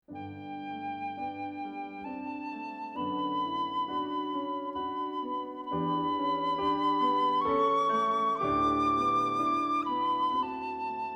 Pipe, for piccolo & piano in D major; Roussel; Complete Chamber Music

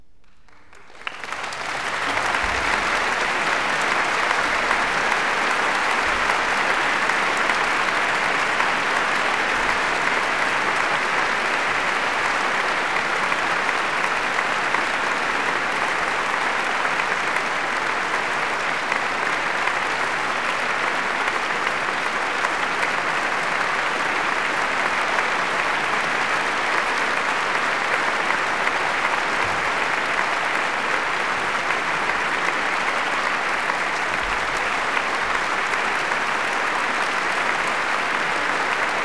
Applause; Symphony in E-flat major, Hob.1-103 'Drum Roll'; Sergiu Celibidache; Haydn

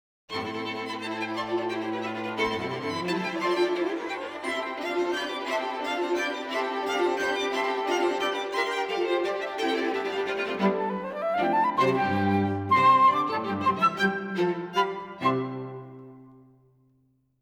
for flute & string quartet

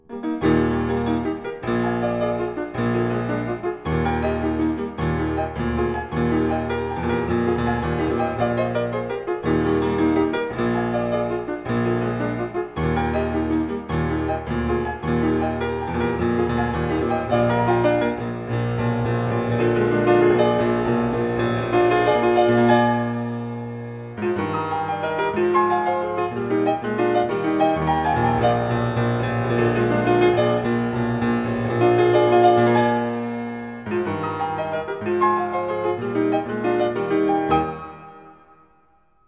Variations and fugue for piano in E flat major; "Prometheus"; "Eroica"; Beethoven

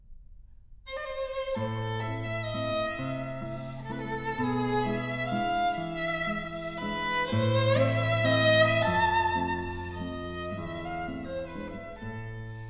German Dances for piano

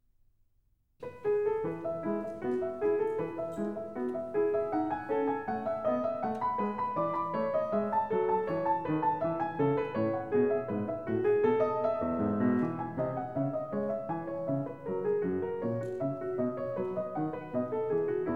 French Suite, for keyboard in E major Allemande; BWV 817 (BC L24); Bach for Babies; Fun and Games for Budding Brains

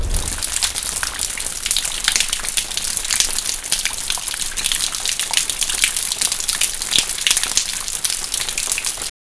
Sound Effects: Rain / Outside

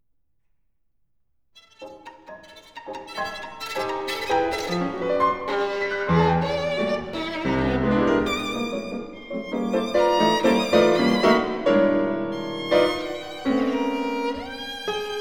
Pieces for violin & piano, Rasch

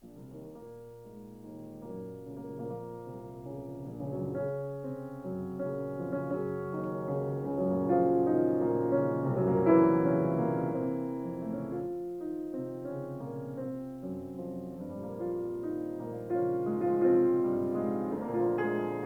Preludes for piano, No. 16 in B-Flat Minor; Scriabin

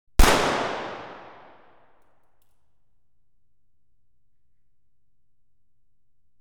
Pistol; Telarc Sampler, Vol 5

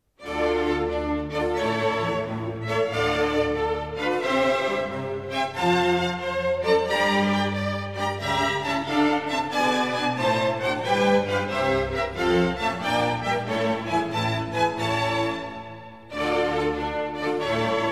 Concerto grosso in D minor, Allegro; Pergolese

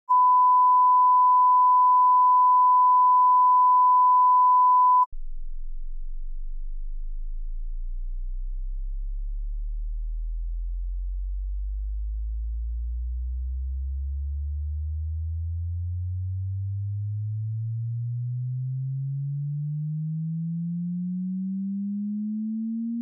1khz, 20hz~20khz -20db R (Emphasis On); Denon Audio Technical CD

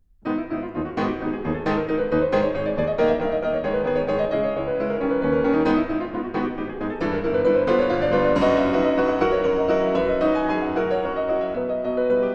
G-sharp minor: Presto, piano; 24 Préludes, op. 28 no. 12

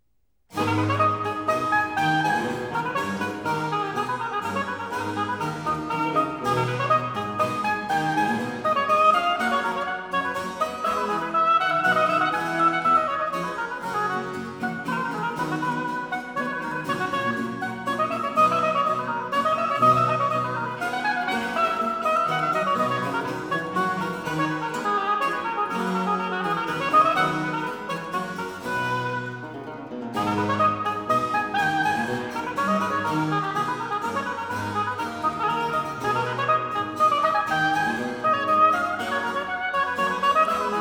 Sonata for oboe & continuo in A minor; Spirituoso; Vivaldi, Telemann; Oboe Sonatas

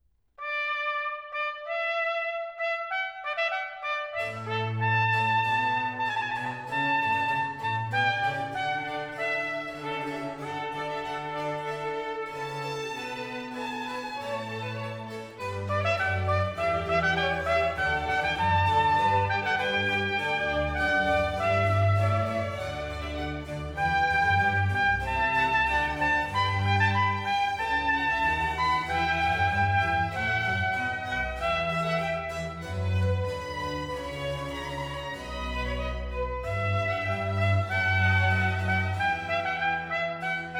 Trumpet, Strings; D Major; Canzone; Il Barcheggio; Sinfonia; Baroque duet

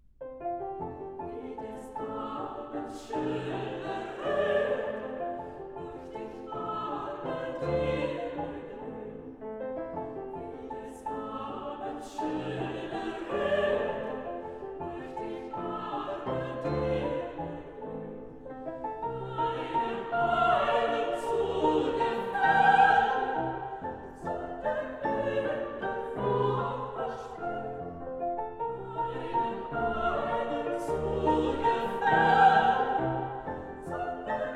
Waltzes for vocal quartet & piano, 4 hands; Liebeslieder Waltzes (18); Brahms

